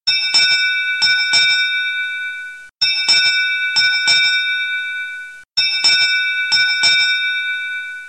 bell ringing

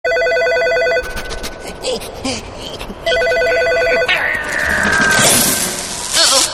Horror Ding Ding

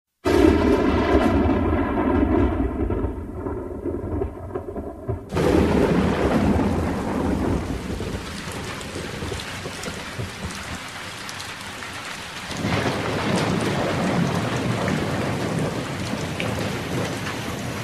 rain effect

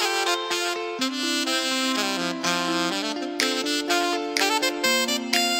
Vivo Notification Tone

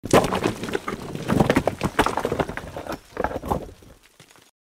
boulder sound shake

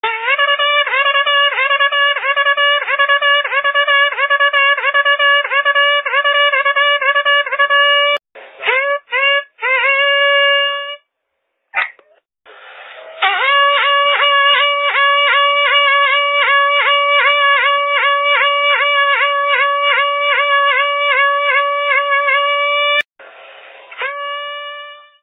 hunting horn

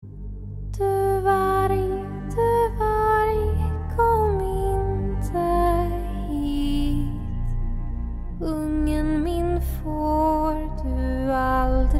Nordic Lullaby

